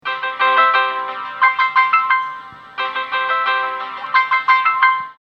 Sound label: Ringtone; TMobile